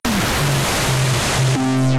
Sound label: Shouting Into Cans, Sci-Fi Effects; Ableton; Mood Reel